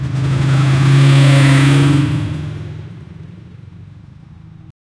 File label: squeeking of motorcycle w, offroad